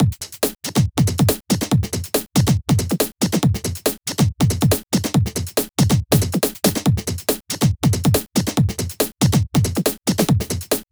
Loops BB 140, BreakBeats; Vengeance Samples

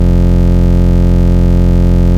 Root F, Bass

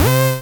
Acid Sounds C, Synths; Vengeance Samples

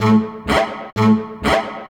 gte 125 a, guitars; house; Vocal House; PS-28 Vocal House Samples

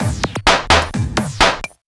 VFX2 Loop 128 BPM

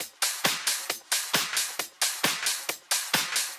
134BPM Loop; Drums & Perc; Cmajor

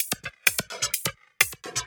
Electro Loop 128 BPM; Vengeance Samples

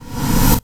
Short Fills & Reverse; Vengeance Samples

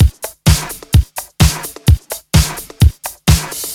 House Beat, 128 BPM

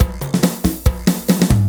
Fill 140 BPM

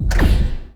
FX Impact; Vengeance Samples

